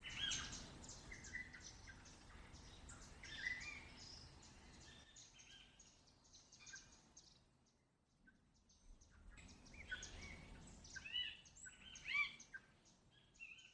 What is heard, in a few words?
AMB RURAL DAY TURISMO; SIMUZENSOUND